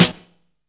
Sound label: king, snares; Zero-G - Funky Elements